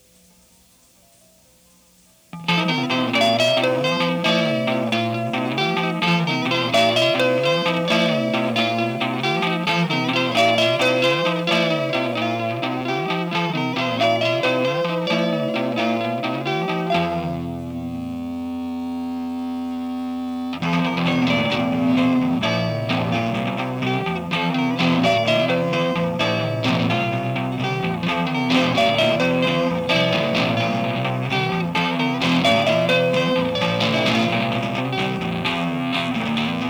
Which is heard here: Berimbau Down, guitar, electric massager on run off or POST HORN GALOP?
guitar